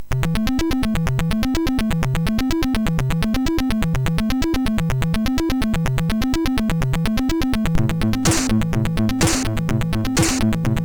MOS6581R4; hvsc

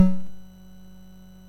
sid MOS6581R2, GAMES; Face Off

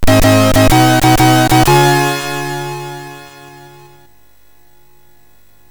Hip Hop, sid MOS6581R3; Mermaid; Early Tunes

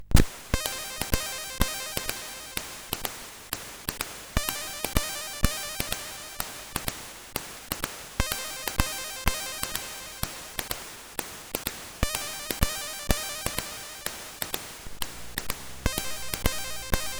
Juba Jazz, CSG8580R5

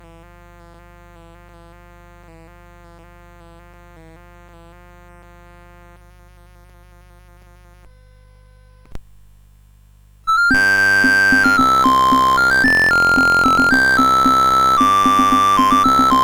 Courante, CSG8580R5

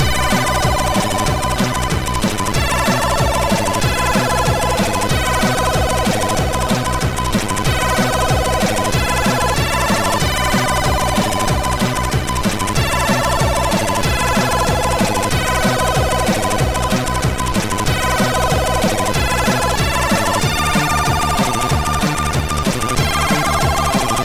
Quick Test Tune, MOS6581R2

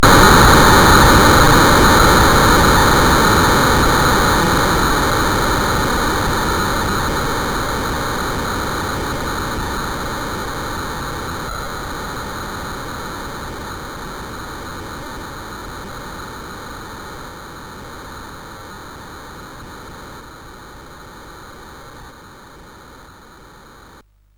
Frantic, CSG8580R5

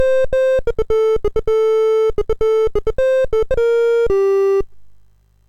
MOS6581R2, GAMES; Sammy Lightfoot

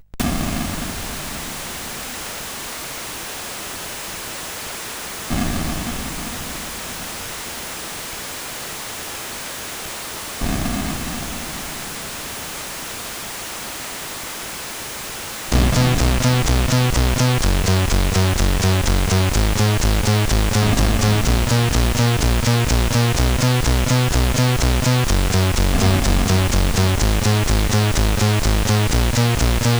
Acid Rain, CSG8580R5

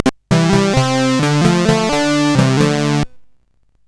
Fire Breath, CSG8580R5

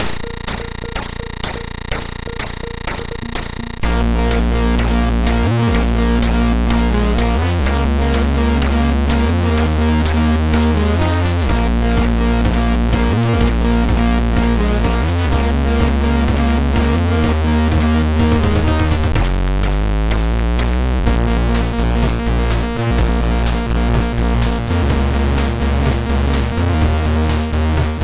sid, MOS6581; Luftrauserz; MOS6581R3

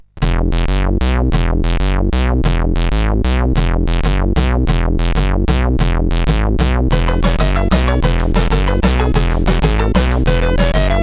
sid CSG8580; Bitola

